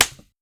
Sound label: slap; sound effects and sound reinforcement; The Passion of Dracula